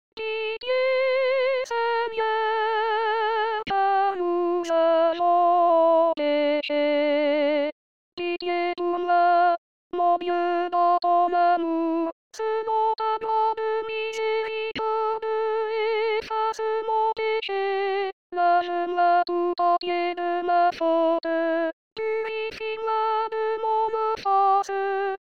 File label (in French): Pitie Seigneur Car Nous Avons peche, Chorale